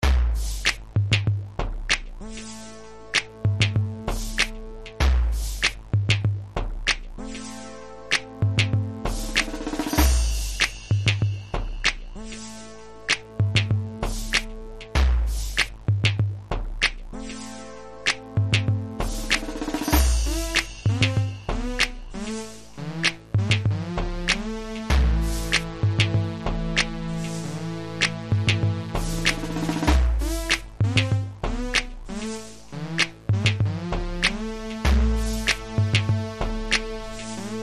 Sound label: Beat